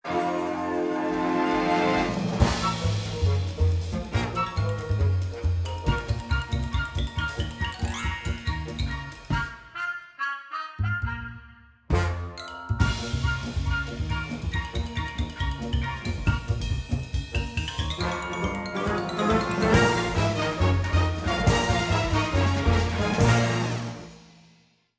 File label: music menu; Grizzy & the Lemmings - Whack A Lemming